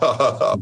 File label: hahaha